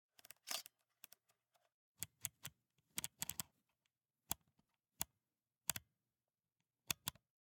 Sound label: Camera, Pentax KS2, DSLR, electronic, mechanical, fiddle, mode dial, focus; Eiravaein Works; Vaeyan IV